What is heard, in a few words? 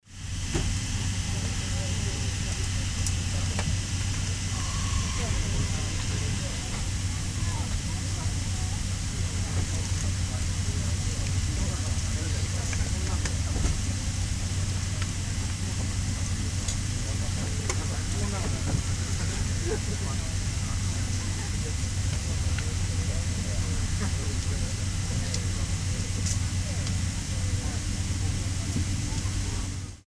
aircraft cabin ambience